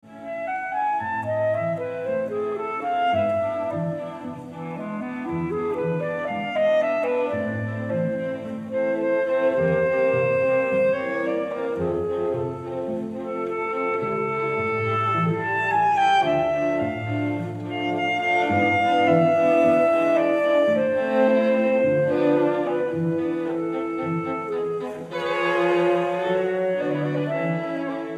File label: Clarinet Quinet in A Major Allegro; Mozart; K581